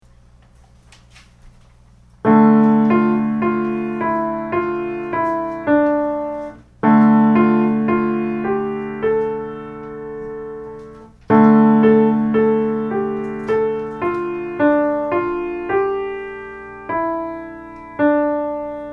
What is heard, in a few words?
Forest Drums